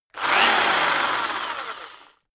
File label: rock drill rev medium medium; maschinen; bohrer